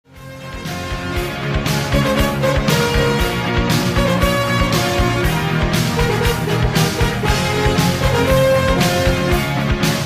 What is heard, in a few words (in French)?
sonnerie